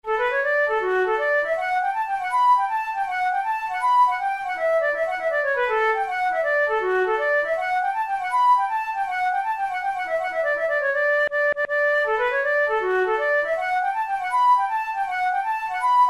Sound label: hornpipe, flute tunes